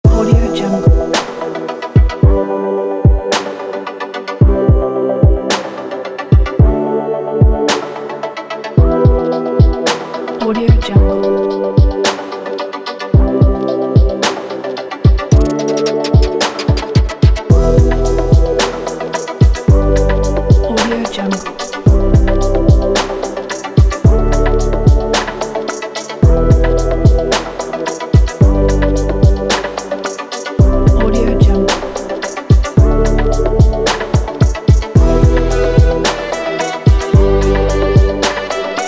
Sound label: smooth wave kit